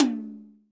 hi tom sound d key, Royality free tom single hit tuned to the D note. Loudest frequency: 2652Hz